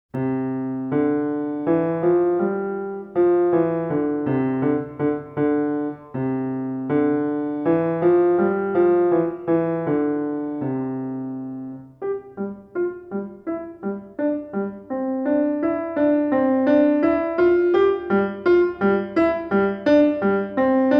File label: Roller Skate Ride piano